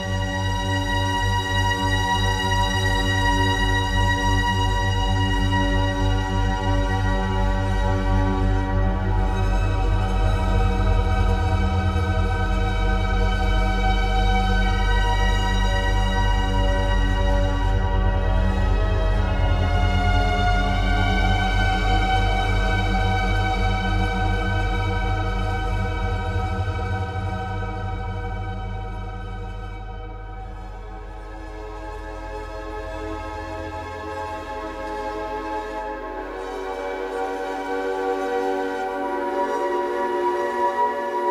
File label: Upstream (Ambient) (Looping); Dungeons & Dragons